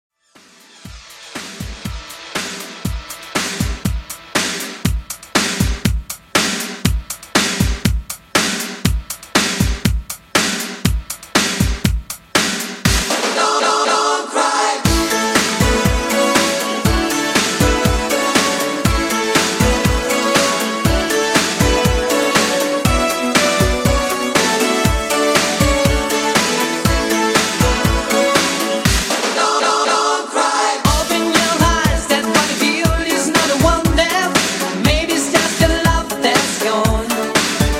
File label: 80s Disco Redrum 120 Bpm